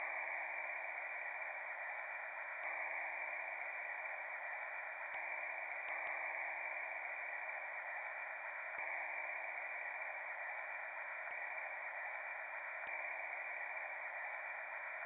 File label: ambient; ionogram